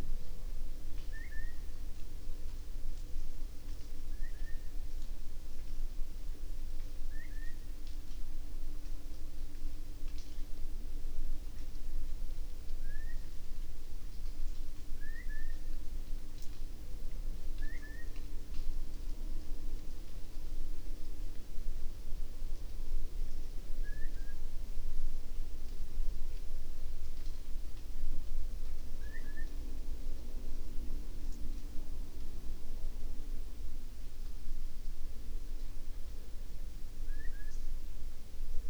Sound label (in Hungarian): nagy poling hangja